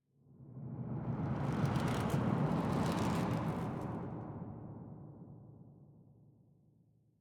绯红森林点缀声; ambient

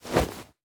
收纳袋倒出内容物声

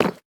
下界砖踩踏声

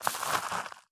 堆肥桶堆肥完成声

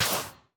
灵魂沙踩踏声

